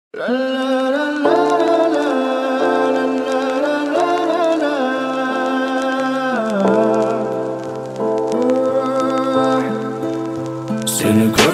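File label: ringtone for phone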